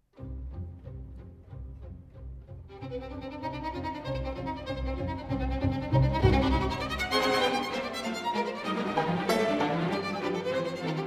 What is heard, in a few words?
souvenir d'un lieu cher op 42 scherzo presto giocoso